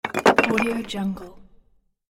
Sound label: stones fall